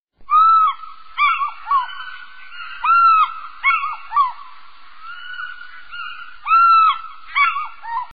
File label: fish eagle cry